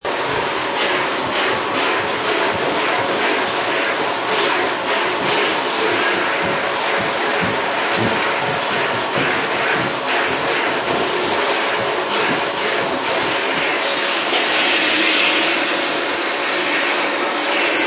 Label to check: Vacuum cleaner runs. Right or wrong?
Right